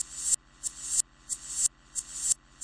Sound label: hihat reverse substitute, DRUM LOOPS and BREAKS, 182 bpm